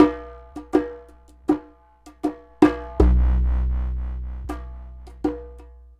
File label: Klong Ya Suprise, Worldly Rhythms; Sonic Foundry (Sony Creative Software); Ma Ja Le Ethereal Textures